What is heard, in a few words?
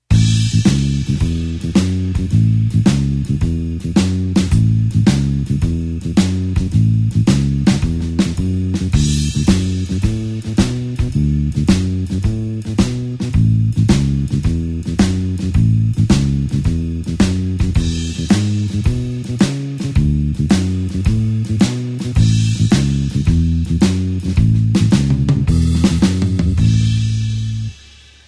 blues bassline shuffle A